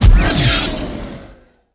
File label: phase in